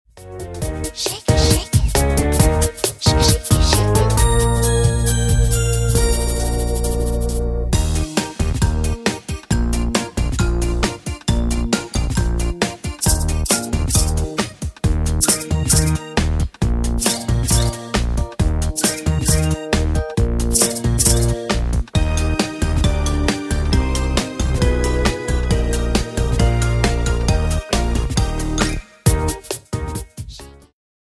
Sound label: Karaoke